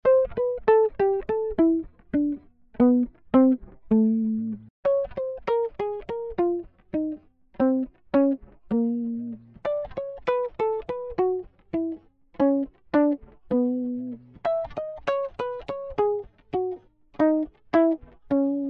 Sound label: Jazz Guitar; Ueberschall Jazz Guitar